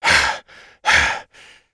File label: Run Breath